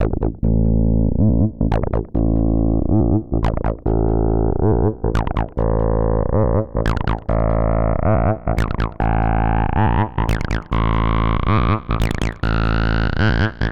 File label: HARD BASS 140 A, Hard Trance, Bass